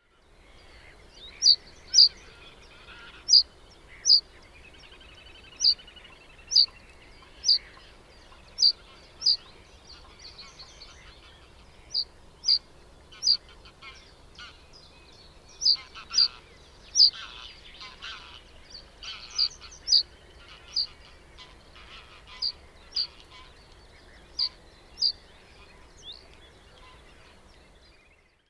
Rietgors Emberiza schoeniclus

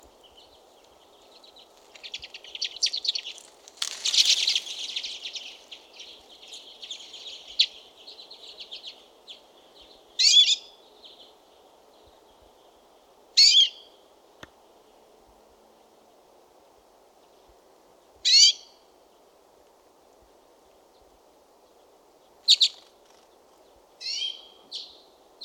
Barmsijs Acanthis flammea

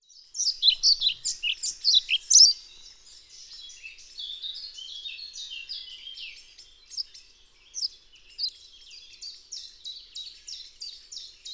Bonte Vliegenvanger Ficedula hypoleuca

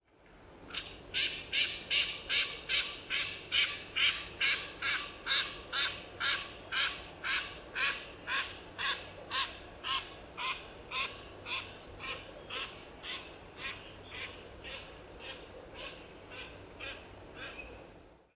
Wintertaling Anas crecca

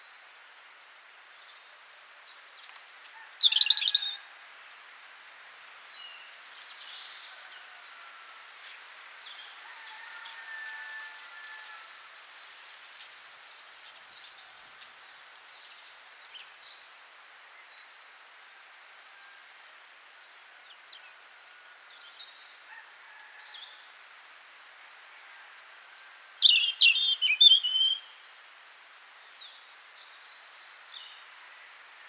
Roodborst Erithacus rubecula

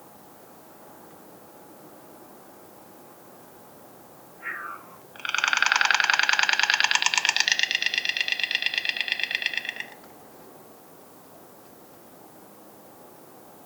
Ooievaar Ciconia ciconia

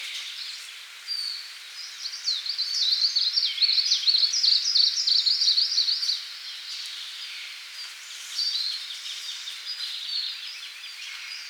Sijs Spinus spinus

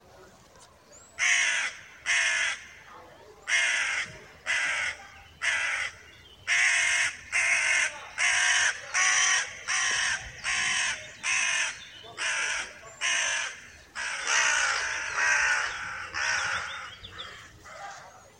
Zwarte Kraai Corvus corone

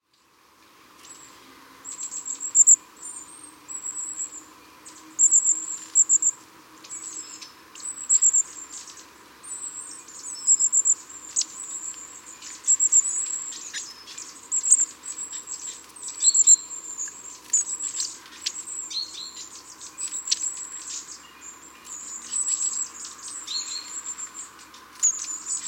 Witkopstaartmees

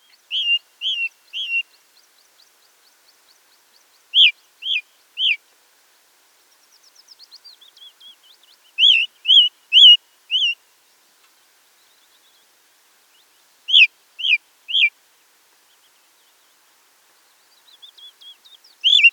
Beflijster Turdus torquatus

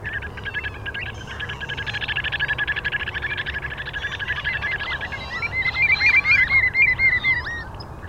Tureluur Tringa totanus totanus

